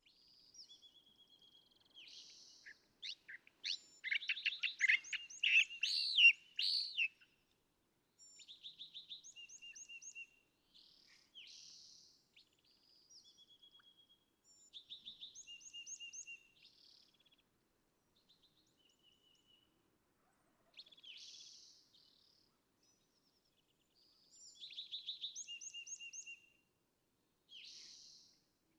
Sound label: California Thrasher